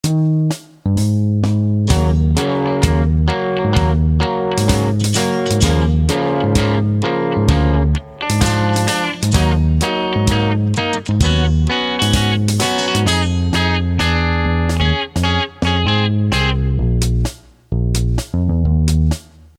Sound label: Chord progression